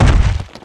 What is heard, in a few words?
walk; tiny